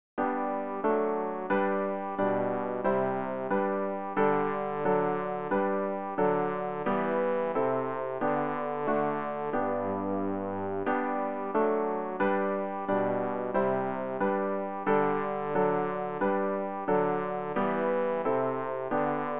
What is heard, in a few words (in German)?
bass; Ref. Gesangbuch; RG 513: Dass es auf der armen Erde